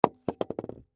Fire Extinguisher, Fire Extinguisher Set Down Wobble Small; Miscellaneous Household; Blastwave